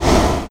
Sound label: welder fire; tf2